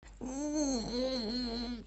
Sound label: ngggghhhh